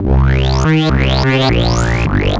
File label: Roland C Line, Roland S100